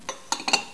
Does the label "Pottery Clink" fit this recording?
Yes